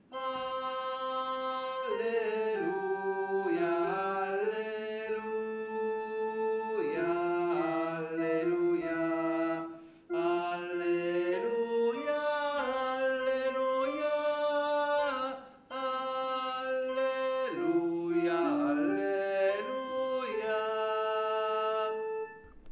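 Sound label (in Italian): Alleluia Passeranno, tenori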